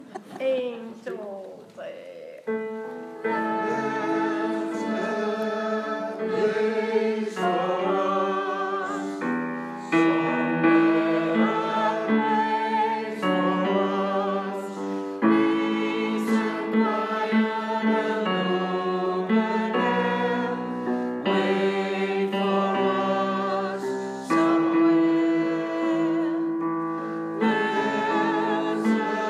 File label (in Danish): herrer